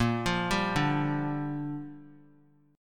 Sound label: A sharp 6th; arpeggiated; JGuitar